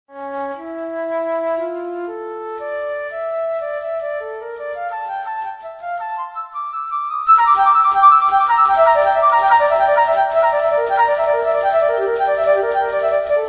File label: Solo Flute